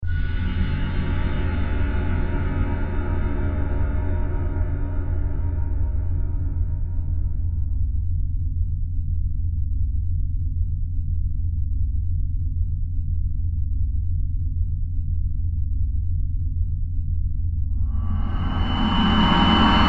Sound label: soundscape